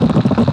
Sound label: flat, tire